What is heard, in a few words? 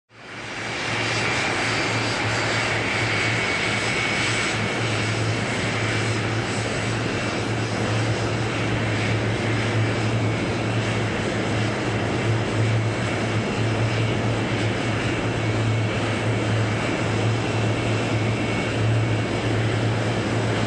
Aircraft Ground Rolling Engine Noise